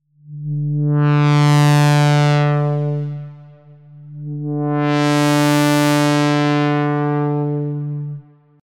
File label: Complete Complex Sound